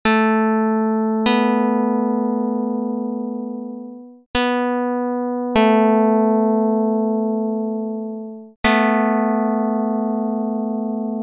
pluck; dr220

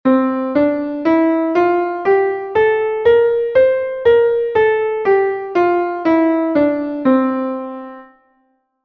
Mixolydian (19-EDO)

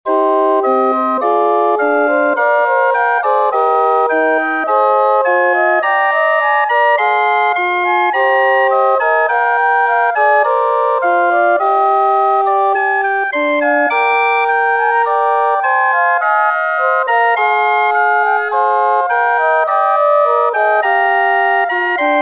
Aeolian Example 36edo; Blackdye

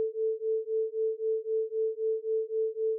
Autogenerated sine 120 cents

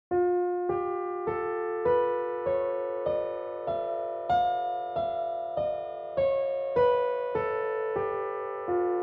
13 edo mode

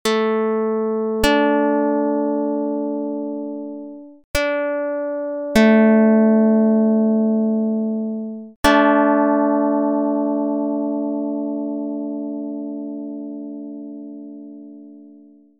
pluck; Jid 33/25

